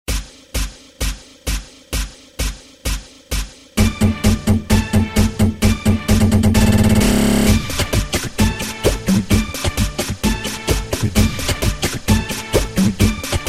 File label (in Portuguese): aquecimento sinfonico